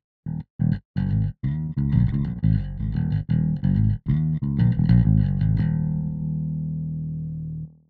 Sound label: bass; SSL X Gate